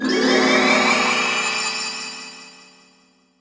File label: Enemy Rose Queen Active Attack Loop